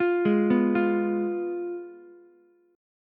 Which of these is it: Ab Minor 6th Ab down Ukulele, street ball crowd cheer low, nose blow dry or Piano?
Ab Minor 6th Ab down Ukulele